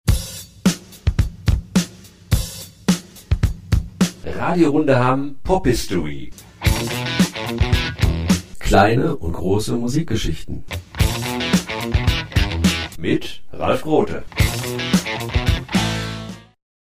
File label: Jingle; PopHistory